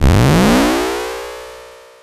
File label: power up